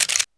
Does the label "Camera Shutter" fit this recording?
Yes